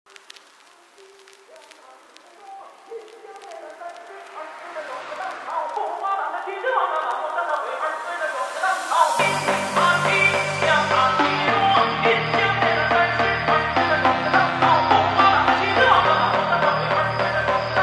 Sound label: phonk remix